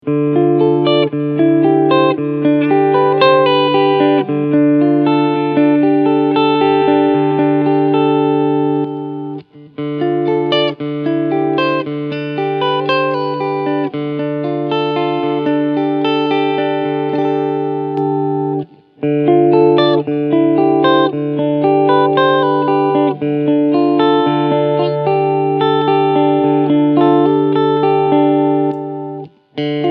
Cleansounds; Ibanez PGMM11

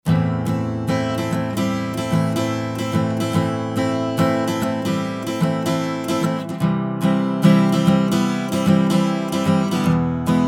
Tube Tech HLT 2A Western Gitarre HLT 2A Bypass

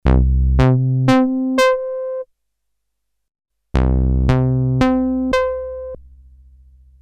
Huellkurven AB Vergleich

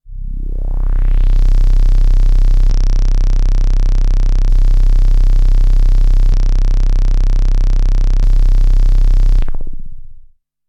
Behringer K2 Filter Vergleich

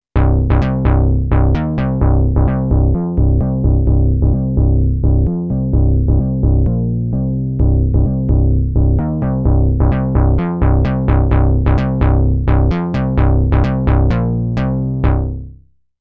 360 Systems Midi Bass Pro internes Filter

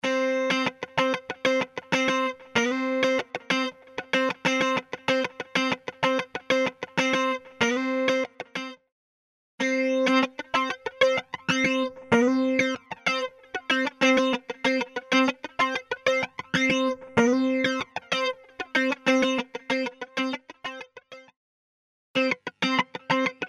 Flanger trocken Flanger; Metaflanger; Cubase5

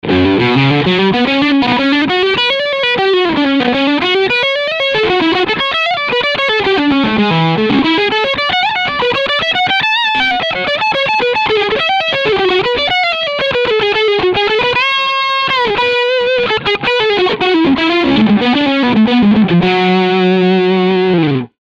Schecter Traditional E Gitarre Leadsound Steg Pickup; Nick Johnston

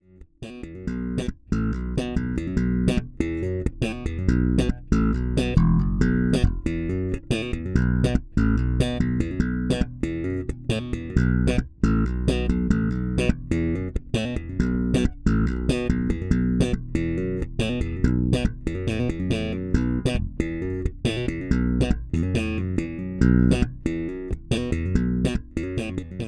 Sadowksy NYC Modern Slapbass aktiv

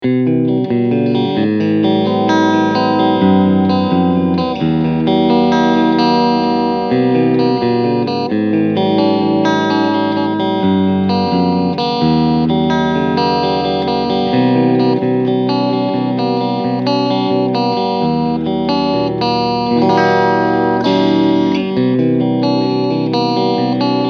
Cleansound beide PUs

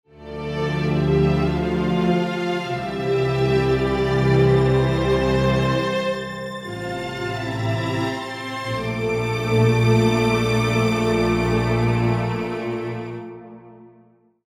Panning und Reverb

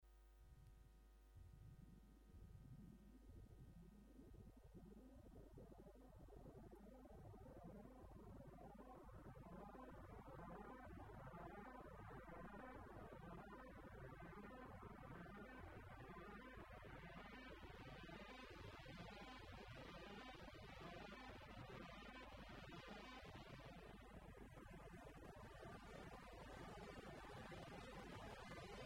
Sequenzer

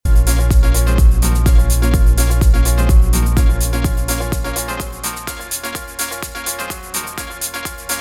Reloop RMX 10BT Bass raus

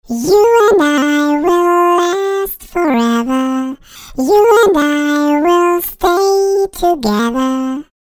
Gesangsspur Hi Oct

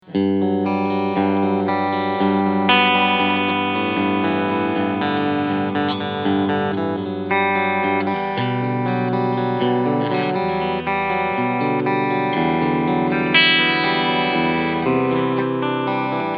Tribute S-500 Cleansound Steg Singlecoil

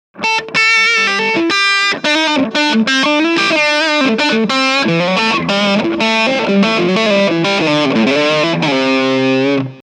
steg zerre

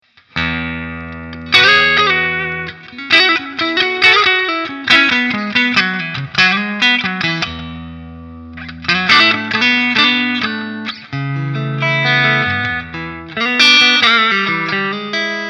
High Treble 9h Normal 10 Strat Hals

